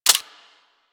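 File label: submachine gun Clip Eject; Warframe; Tenno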